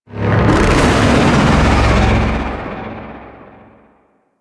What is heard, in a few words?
Void Dragon Vocal Ability; Enemies; Vocals; Warframe; Duviri